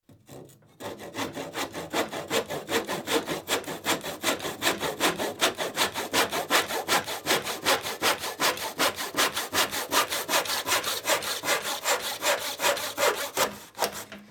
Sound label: Tenon Saw, Small Piece Of Wood